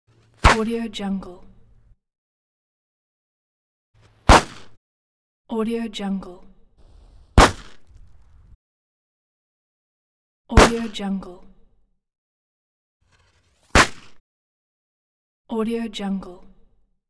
firecracker explosions; hollywoodedge

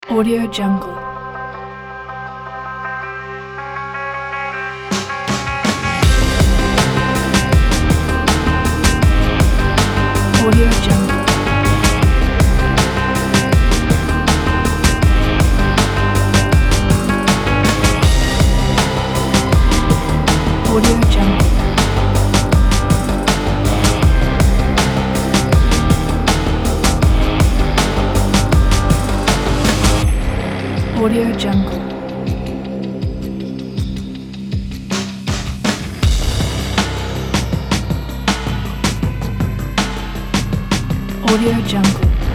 dark brutal hiphop trailer

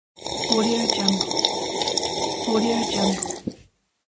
slurping

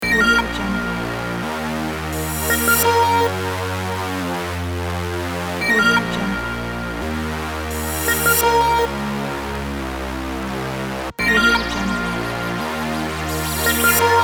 hip hop melodies

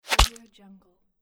wooden arrow flesh impact

